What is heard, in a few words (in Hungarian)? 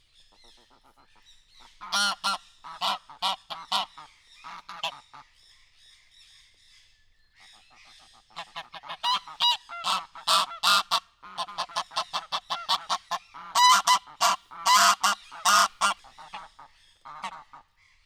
továbbra is ketten, nyári lúd; miskolci zoo 2018